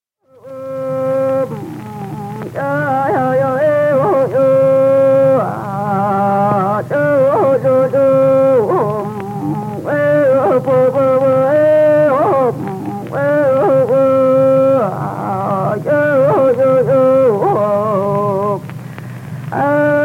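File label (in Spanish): Canto de mujer, Mapuche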